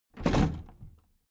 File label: big plastic case lid put down regular